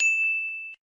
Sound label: Bell